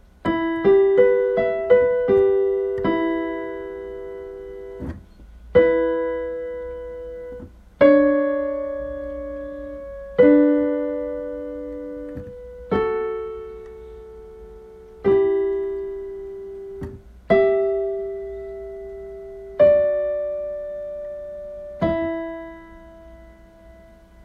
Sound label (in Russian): интервалы